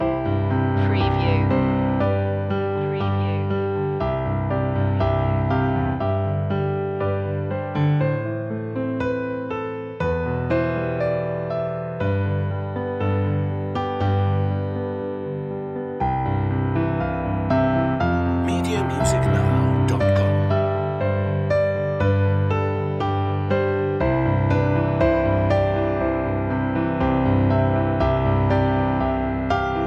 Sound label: o come all ye faithful piano loop; media music now